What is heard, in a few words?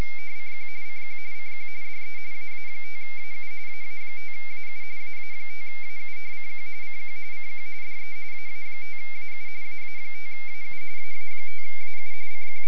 rtty; 8bit